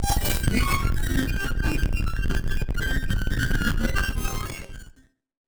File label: Battered Synth; malfunctioning synth